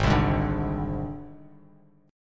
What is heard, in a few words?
Gsharp Major Flat 5th, Bass Standard; strummed; 4,3,6,7